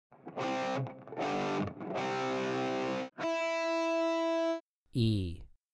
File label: Guitar One Note